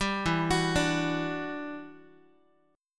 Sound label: E Minor 9th, down, Guitar; Em9 arpeggiated; 10,12,9,12,x,x